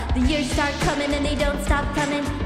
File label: Singing; IRyS